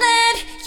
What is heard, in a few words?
vocal